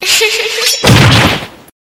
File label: scissors attack